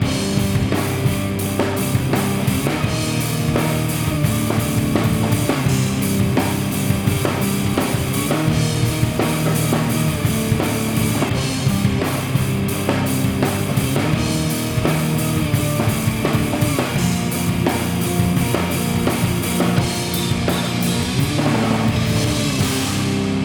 Metal Guitar Attack

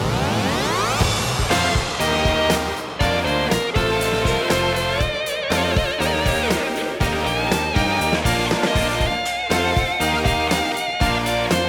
Bass Mix no Redlight Dist

